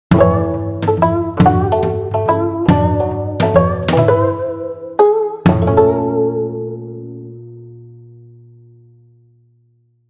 Gacha theme; moon rabbit

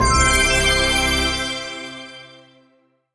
UI Treasure Bless Success

